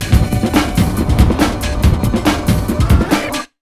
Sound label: RUN 140, Big Beat Drumloops; USB Soundscan vol.25; AKAI